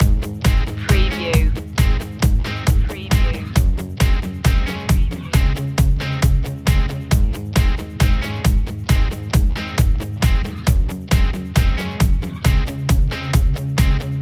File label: rock drop and roll loop